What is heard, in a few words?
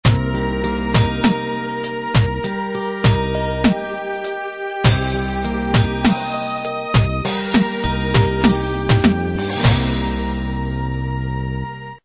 16 beat ballad end